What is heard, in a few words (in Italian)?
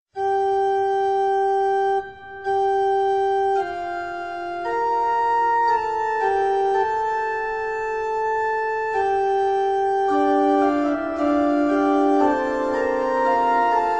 organo; Clasica